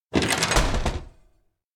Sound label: door loghouse open fast; anemoi